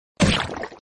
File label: splat